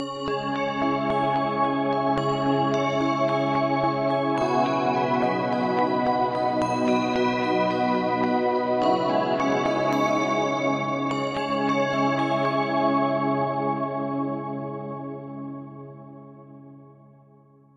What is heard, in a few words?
bells